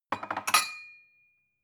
glass shelve soft

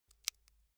bamboo small break hard

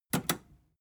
metal button robust click fast

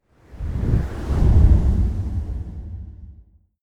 push

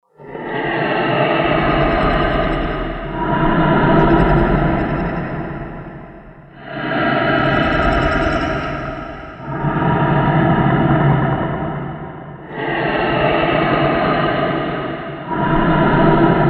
breath full mix

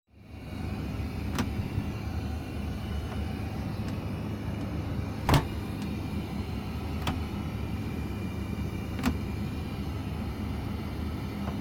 airplane toilet door

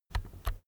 keyboard external key press slow soft

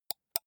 computer mouse left click single

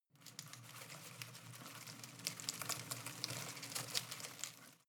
home plant leaves rustle rattle long

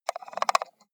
computer mouse wheel scroll slow

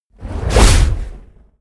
whoosh fire noise blast medium

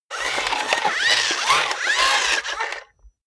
robot movement long

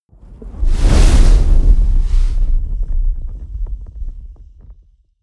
whoosh fire ignite large

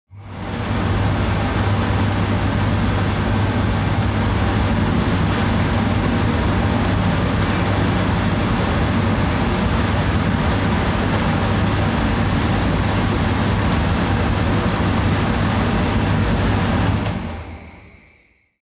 stove extractor fan heavy

